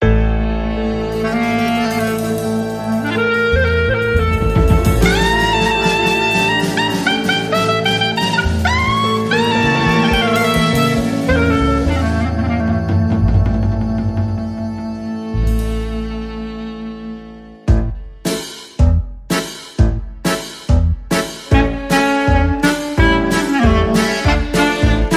Polka

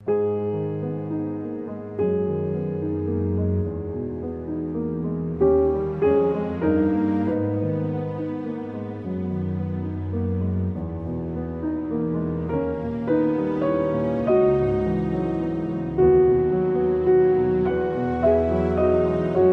With Strings

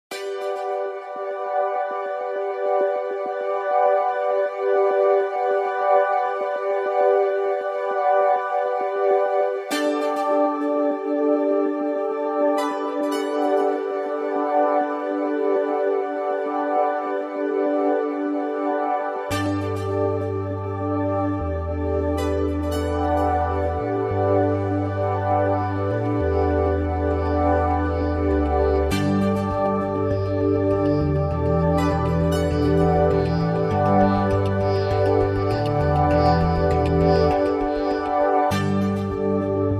Synths Underscore Mix

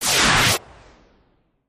Harsh Noise Zap

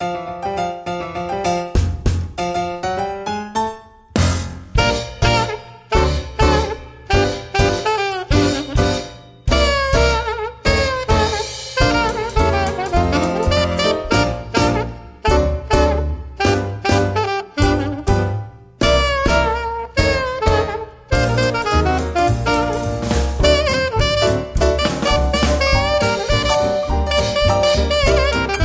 Theme Only

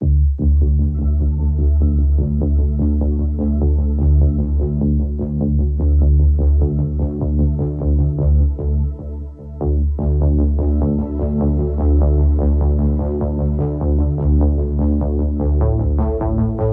Turbinated Synth

